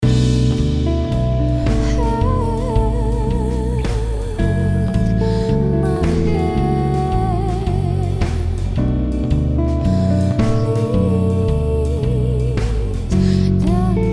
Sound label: Samsung A90 Ringtone